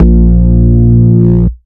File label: Root A#, Ultimate Bass; Vengeance Samples